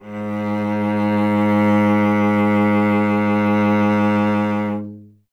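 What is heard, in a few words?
strings cello G#; Miroslav Vitous; Solo Instruments VOL-1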